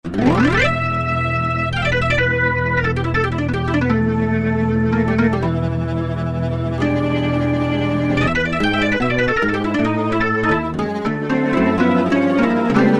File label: R ORGAN, Roland Guitar And Keyboards